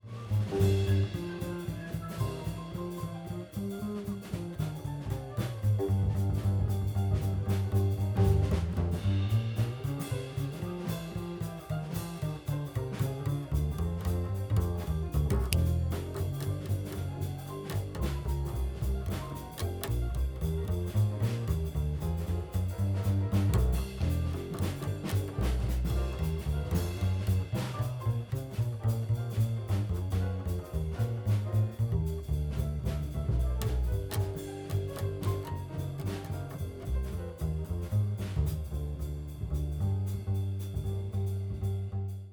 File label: Bass